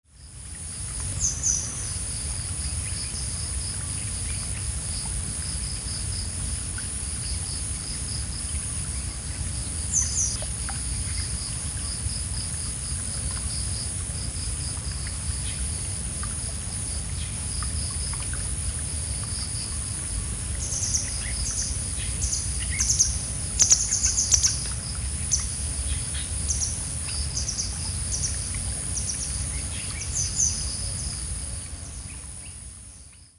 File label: Motacilla Cinerea; Grey Wagtail